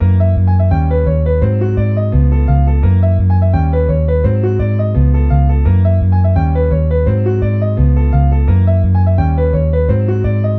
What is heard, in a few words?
ii harms for sound maj